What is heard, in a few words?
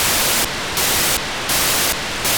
436MHz3 9k6 GMSK beacon, satellite; RAMSAT; On May 21st 2022 at 15:50 UTC Daniel DL7NDR received on 436.300 MHz the 9600bps GMSK beaco